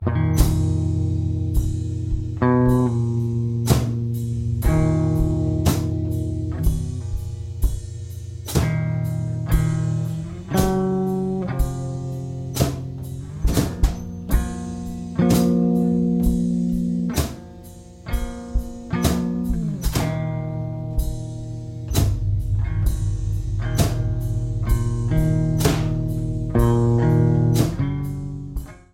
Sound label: Damage instrumental